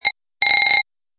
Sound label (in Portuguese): Toque